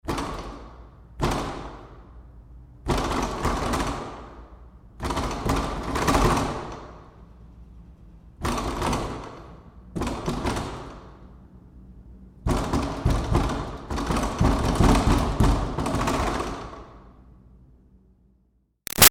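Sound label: Door Metal Commercial Push Interior Rattles Bangs; Doors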